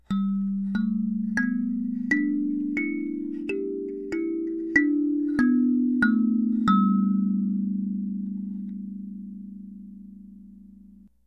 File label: kalimba major scale